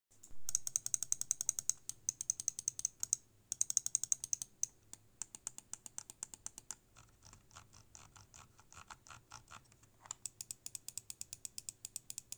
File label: corsair katar pro wireless; test peripherique overclocking